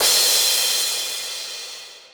soft hit finish

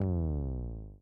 Bass FX

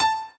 piano

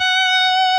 solo Left-hand vibrato

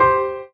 Drop Lead; osu

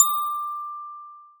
D6 bell

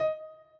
chord

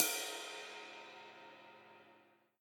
Jazz Cymbal

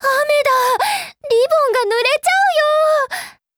applause; osu

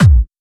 drum hit whistle

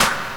Clap

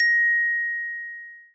A#6 bell

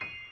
piano high

